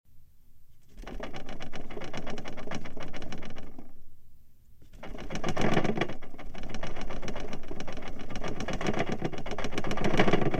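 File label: everything shaking inside the house; Звук землетрясения в доме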